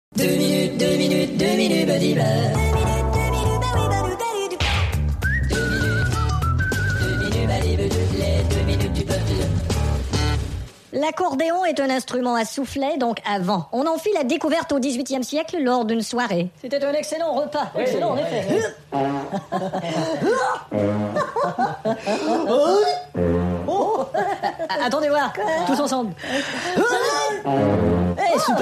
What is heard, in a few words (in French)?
Musique l'accordéon; Les 2 minutes du peuple